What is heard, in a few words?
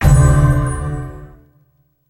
achievement; escape from frankensteins tower